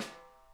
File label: edg tap, snare; Sonic Reality; Interactive Drum Kits